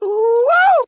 "oowoo", voice; ooh ahh

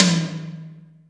softball, toms; Real

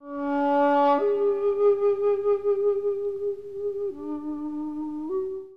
flute, Sad

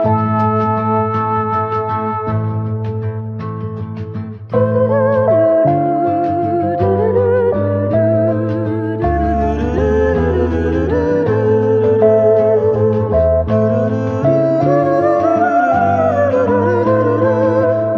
keys and voice doo doo doo; Loops; Phonky

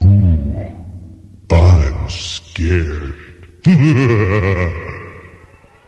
ooh i'm scared LAUGH; Voice

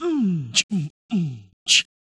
Beatbox, Drum loops

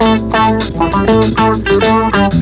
guitar, Phonky Loops

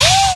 "woo"

